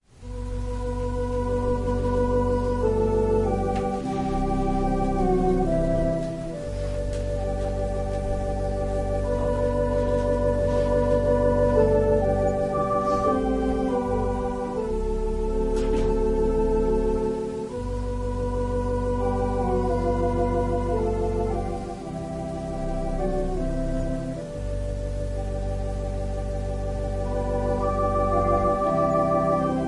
Organ prelude

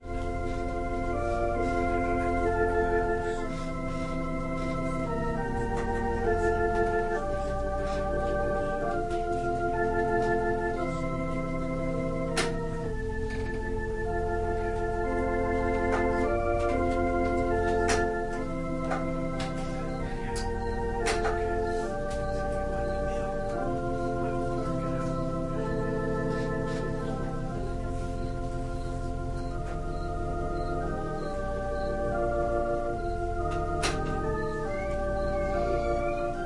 Organ and violin prelude

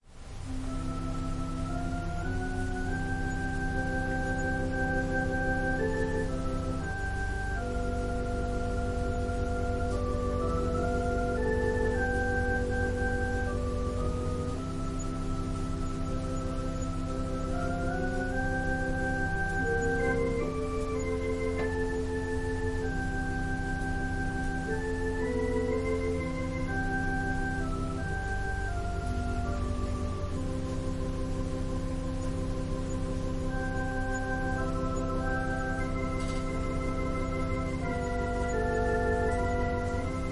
Organ prelude

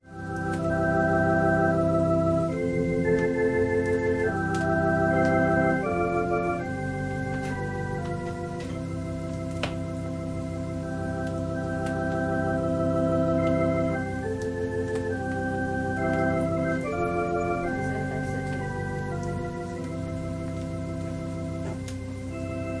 Organ prelude

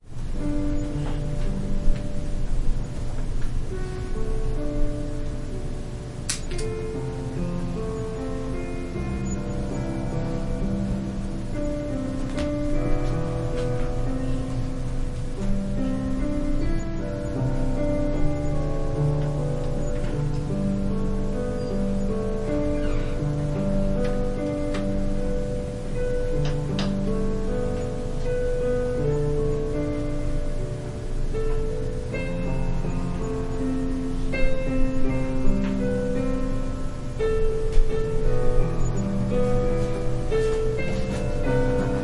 Piano prelude; Precious, incorruptible, and unfading

